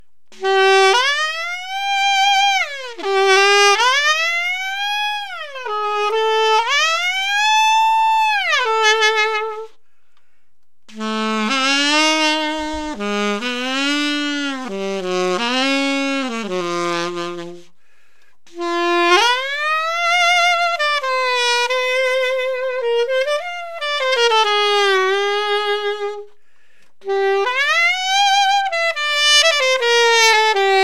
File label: gliss